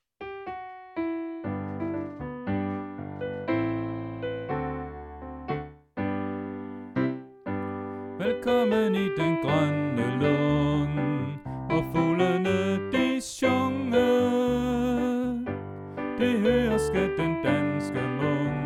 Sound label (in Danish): Sopran; oevefiler